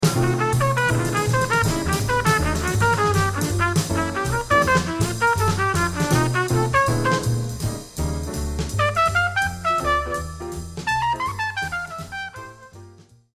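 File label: trumpet solo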